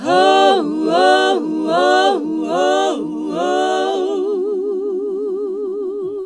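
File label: Gospel; Best Service Gigapack 1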